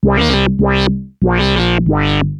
ARP Odyssey, Arp A Line, synth samples

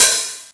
Cymbals OH, Open HH; Vengeance Samples

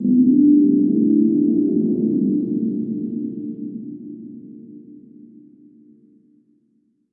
Hits & Explode

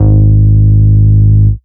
Bass, Root G#; Vengeance Samples

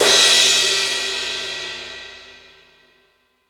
Crash; Vengeance Samples; VEE Cymbals

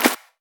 Pre-Shifted Claps; 51ms; Vengeance Samples

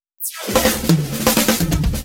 Fill 128 BPM; Vengeance Samples; VEH1 Loops

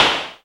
Snare; Vengeance Samples

